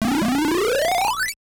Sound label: dragon on